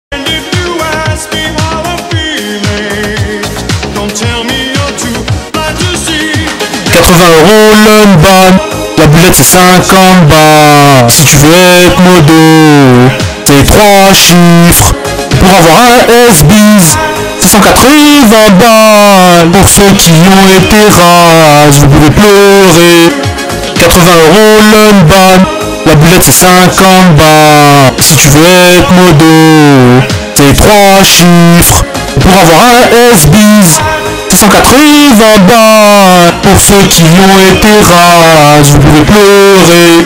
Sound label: bass boosted; LeMondeRoleplay; explicit